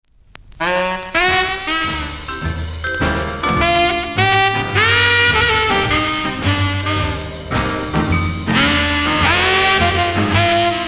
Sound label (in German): Saxophon